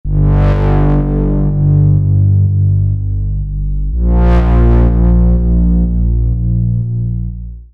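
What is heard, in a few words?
bass G minor; Tagavaka x Basic Wavez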